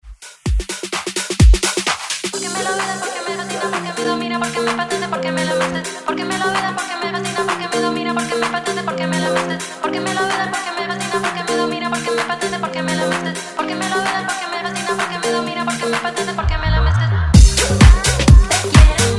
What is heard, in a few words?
Dance Redrum